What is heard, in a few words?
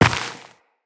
Step Dirt, Footsteps Walk; Characters; Tenno